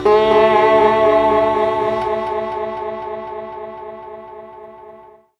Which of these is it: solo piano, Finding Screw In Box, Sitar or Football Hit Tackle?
Sitar